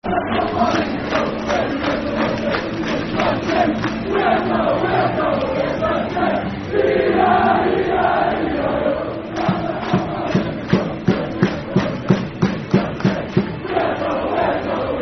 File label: Football Chant, Darlington; E-I-E-I-E-I-O; Darlington Dfc